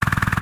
Seedling Attack

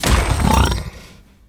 Great Boar Attack

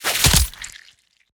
Scorpion Attack

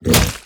Dire Toad Attack

